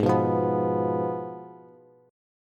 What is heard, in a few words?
Ab Minor 6th, Ab, Bass; Abm6 strummed; JGuitar